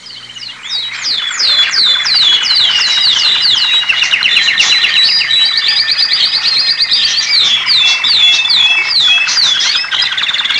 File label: Canaries chirping